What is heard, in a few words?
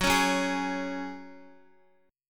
F sharp Suspended 2nd, Guitar; strummed; JGuitar